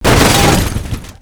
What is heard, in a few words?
vehicle impact heavy, v8; Half Life 2